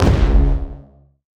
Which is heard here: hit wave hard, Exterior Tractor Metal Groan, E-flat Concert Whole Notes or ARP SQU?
hit wave hard